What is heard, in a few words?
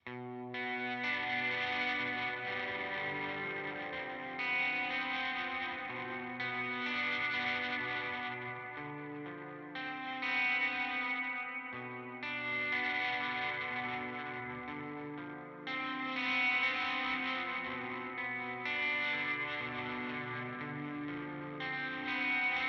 Walrus Audio Melee R D 2